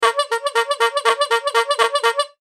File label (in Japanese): パフパフパフパフパフ